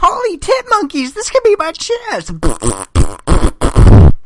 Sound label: death sound; dee bee kaw